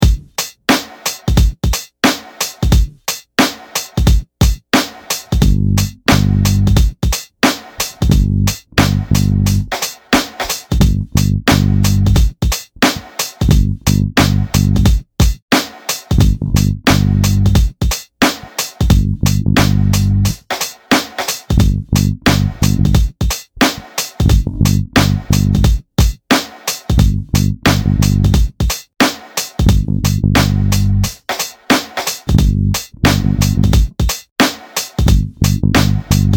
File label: Rhythm Guide, bass; reading sheet music and tablature; a bassist's guide to reading sheet music and tablature